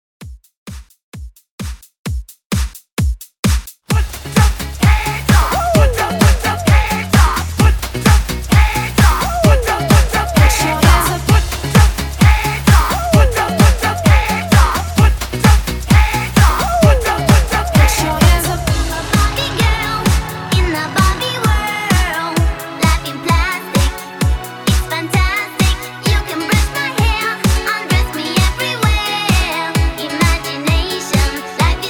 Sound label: 90s Hype 130 BPM; REDRUM